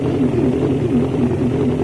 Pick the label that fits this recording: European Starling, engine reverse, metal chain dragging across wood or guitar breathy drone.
engine reverse